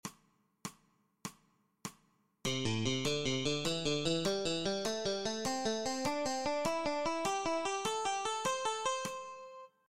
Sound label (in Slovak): osminove trioly nahor alternativne brnkanie; cvicenie